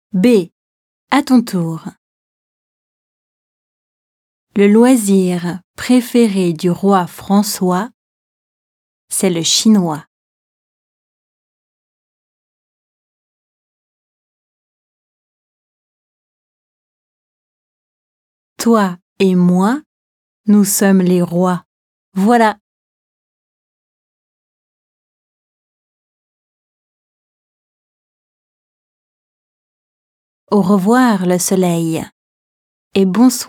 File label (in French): rythmes et sons